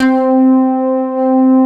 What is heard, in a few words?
ANA PADS; Pro-Rec - Synthsonic; JV ANALOG1